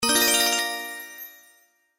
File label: Wild Symbol Win; slots; Pirate Quest